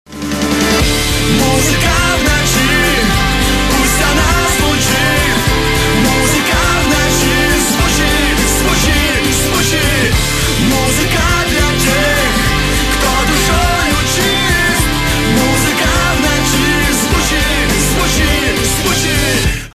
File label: cellphone symphony